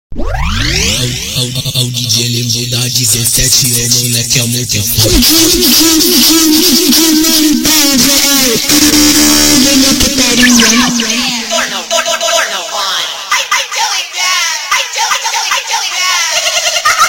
FUNK

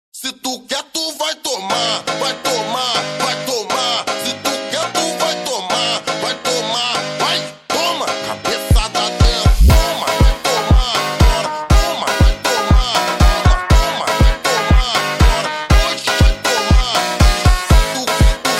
Funk slowed

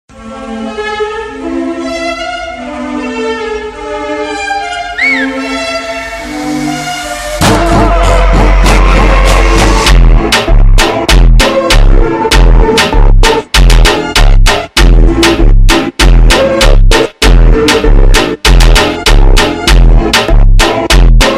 Funk super Slowed

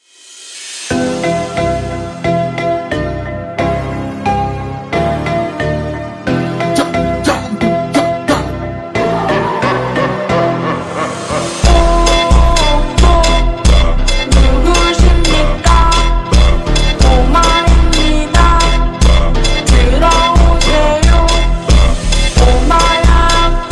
Funk Ultra Slowed

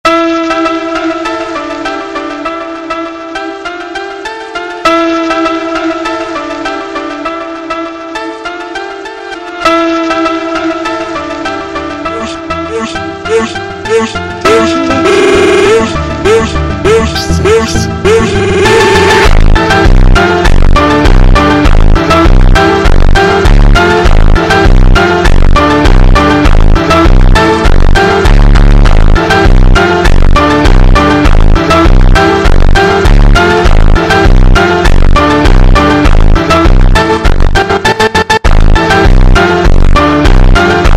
Funk slowed

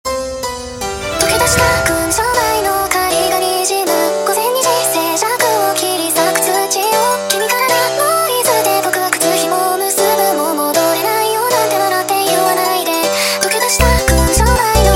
Montagem sped Up

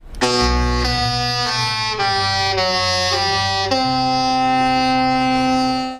ship horn; Disney

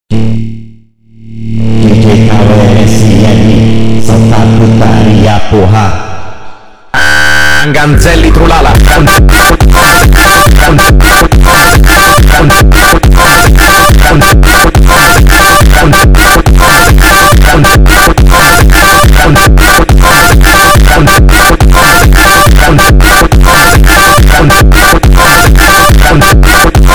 FUNK superbass

